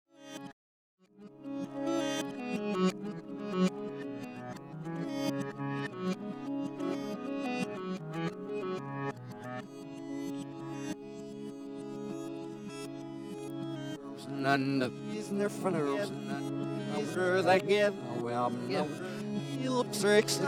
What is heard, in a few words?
spanish perfume rev